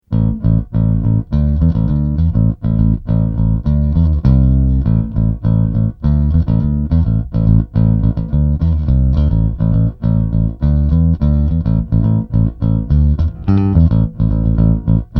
concert

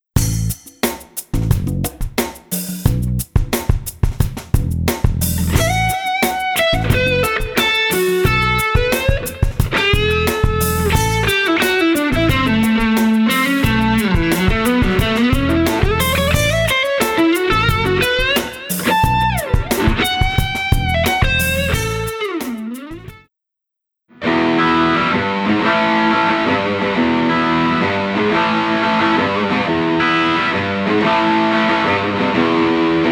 sound check

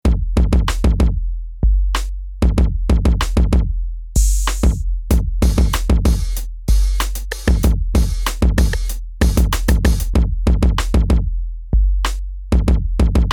beat

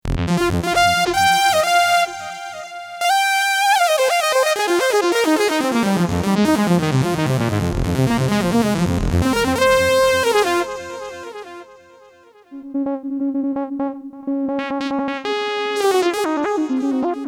modified lead; fantom xa

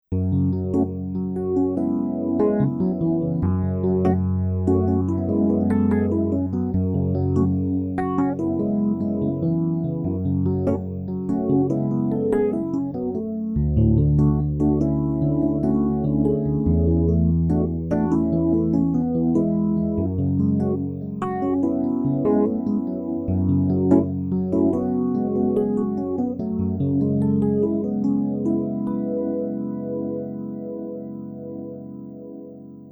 phase ep

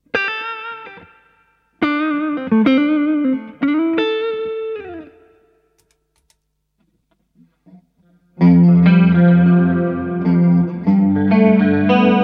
jc clean efx; cube-80gx

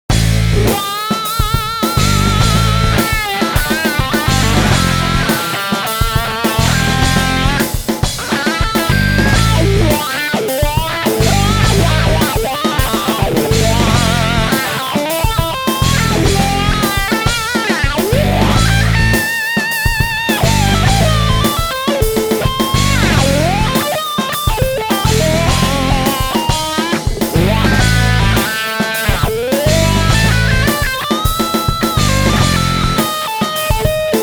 wah lead

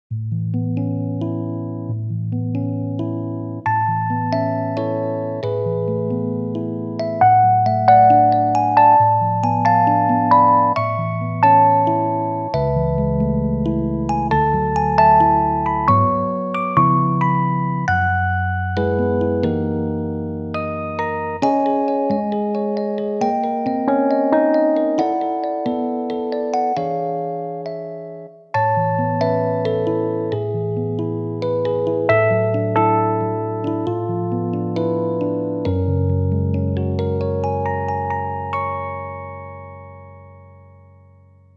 e-piano; HP-203

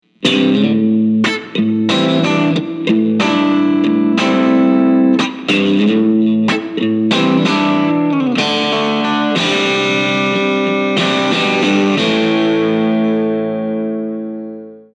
brit combo; cube 30

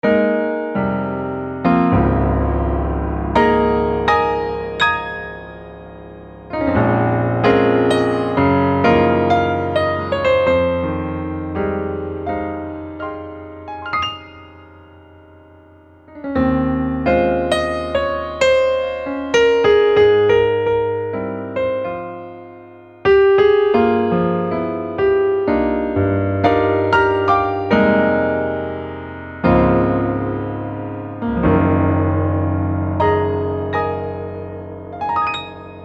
live set grand; fantom-g; g-grand

